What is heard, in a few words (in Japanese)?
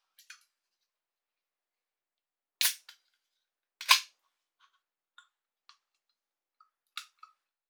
缶ジュースのプルタブを開ける